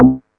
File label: BASS, MEMORYMOOG; Rubber Chicken Software Sample Bank; OLD SYNTHS